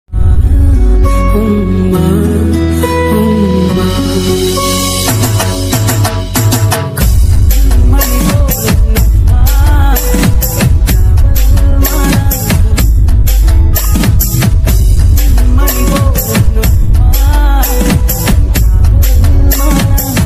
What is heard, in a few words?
tamil mother loves ringtone bgm extra sound dj remix ringtone